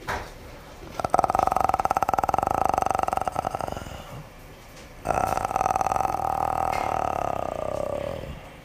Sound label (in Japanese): グロッタルサウンド